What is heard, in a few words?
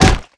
sledge hit; melee weapons; tfa starwars melee weapons pack